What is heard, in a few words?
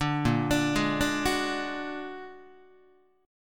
A sharp Augmented 7th A sharp Guitar; A#+7 arpeggiated; JGuitar